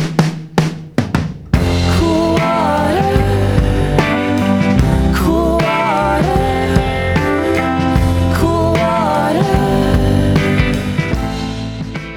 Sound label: Music and human voice; Lime Kiln